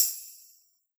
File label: PERC L, Drum & Bass 160 BPM; Ueberschall; Drum & Bass Flash Trackz